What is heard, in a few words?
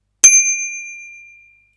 bell; 卒業研究発表で使うタイマー